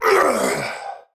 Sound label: Death, Battle; en; Tau; Tau Dax Knight